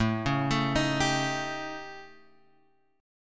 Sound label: A 7th Flat 5th Guitar Open G